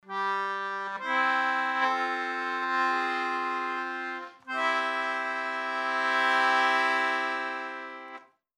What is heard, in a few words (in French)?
accordeon